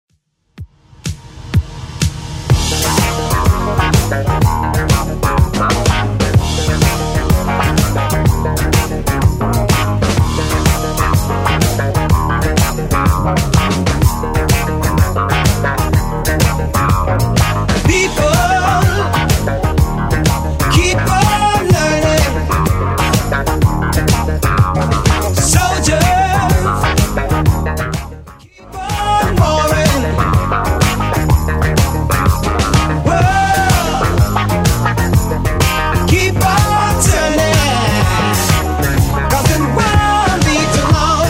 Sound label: 70s Redrum 125bpm